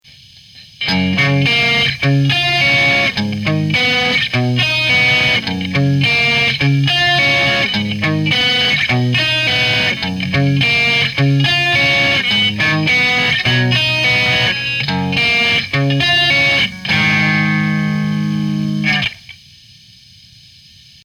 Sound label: E Guitar Picking High White